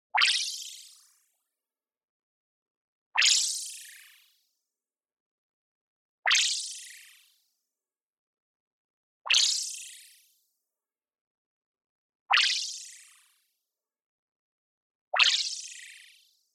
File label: movement fast, sword freq shift; boom library; Anime Essentials; DSGNMisc